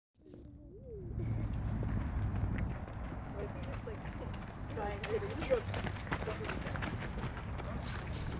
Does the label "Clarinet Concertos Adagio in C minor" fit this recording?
No